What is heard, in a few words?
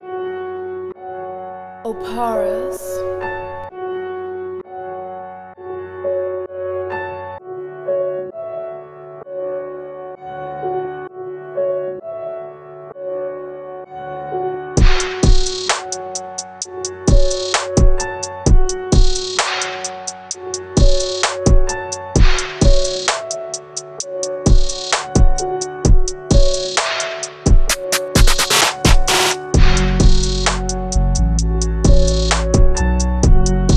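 65BPM, instrumental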